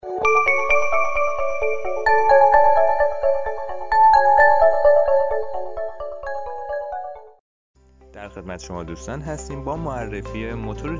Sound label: motor egg incubator